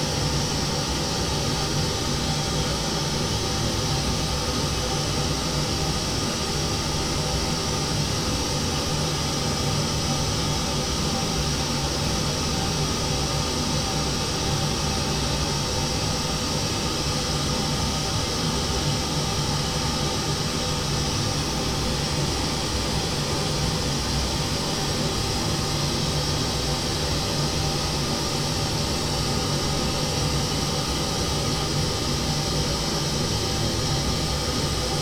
Pink Noise

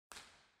IR mic palco; Eigenmike; Scala; B-format; em32; Scala-2010; mic palco1; WXYZ

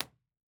IR Bin Centrale, Listening Room; IR Binaural 32bits

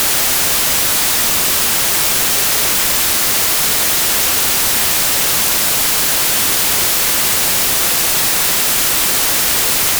White Noise

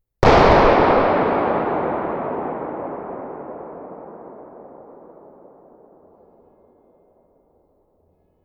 church; Moreno

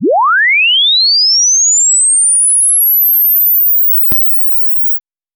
sweep lin

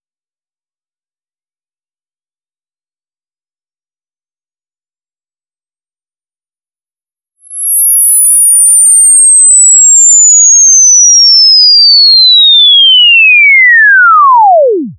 Invsweep

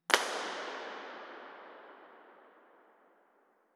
IR sweep, Cathedral Sound System; IMP-RESP; Bergamo

